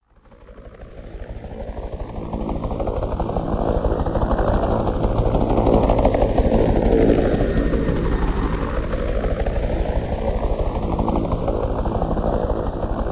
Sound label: heli; cstrike; zm japan